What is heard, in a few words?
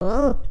Edge Backwards; foley